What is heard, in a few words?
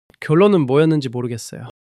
struggle